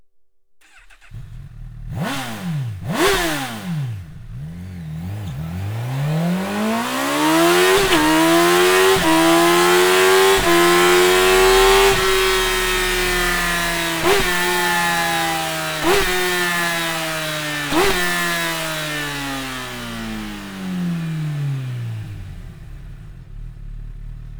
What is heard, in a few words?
Sound Slip-On